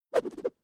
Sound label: Whoosh Swish Sweep By Long Bamboo Cane Swish Wobble Swish Sequence; Staff And Rod